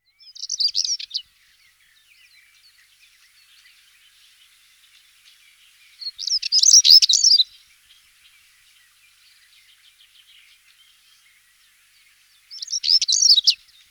schwarzkehlchen, gesang; singvoegel; roche 2004

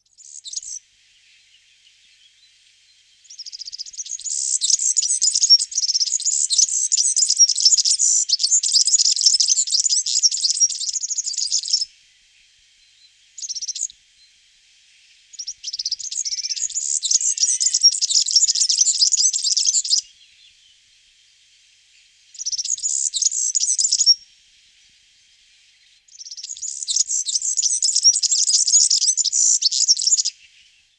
girlitz, gesang; singvoegel; roche 2004

